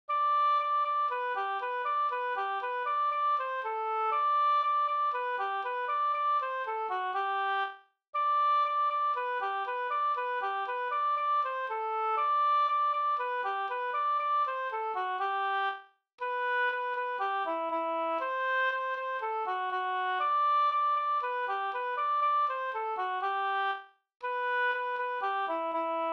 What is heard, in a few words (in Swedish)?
folkdans; Den toppede hone